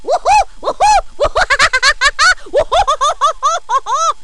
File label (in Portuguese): risada, sons humanos